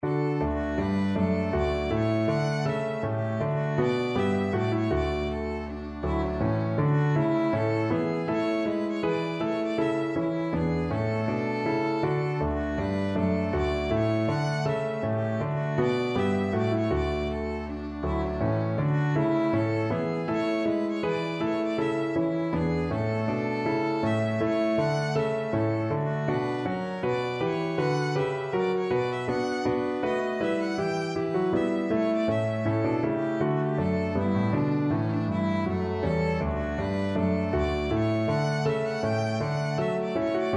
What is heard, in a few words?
bouree, violin